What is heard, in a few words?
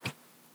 Whistle, organic wooshes; Micro Pack